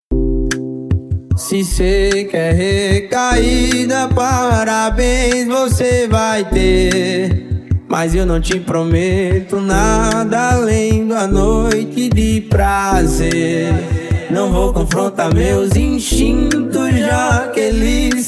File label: funk remix bass; viral tik tok